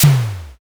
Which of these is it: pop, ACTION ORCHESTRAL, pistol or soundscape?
pistol